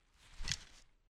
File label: Grab arrow